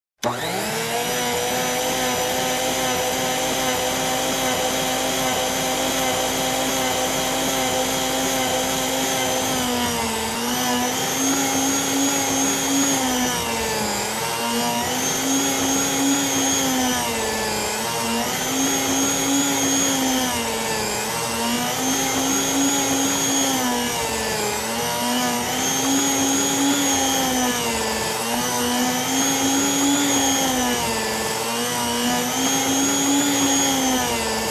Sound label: Mixer